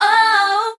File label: "oh" SUNG